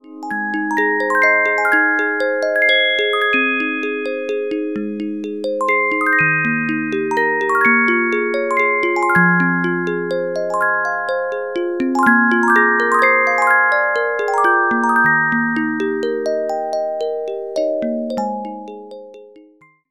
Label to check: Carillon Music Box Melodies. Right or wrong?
Right